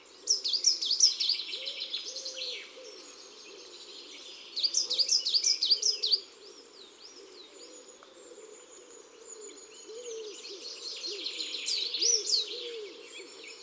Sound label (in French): Mesange noire, Chants d'oiseaux des bois et des forets